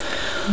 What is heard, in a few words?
NOISE, CLASSIC VOX; Best Service; Hallelujah